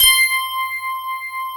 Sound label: FM ELP C5 L, Keyboards Old School; USB Soundscan vol.09; AKAI; FM ELP 7